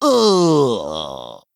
Human Male Death

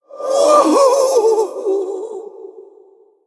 Male Human Ghost Death

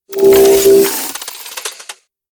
Mecha-Leaper Death

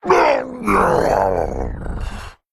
Male Worgen Death

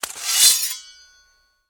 1H Sword Fine Underlay Play

